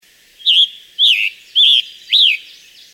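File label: Scarlet Tanager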